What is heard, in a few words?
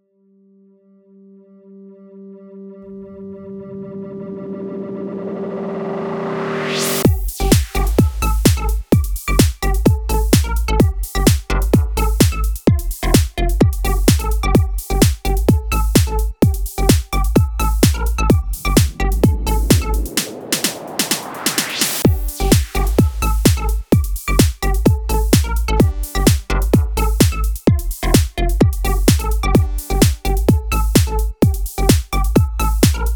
Neutron Demo Track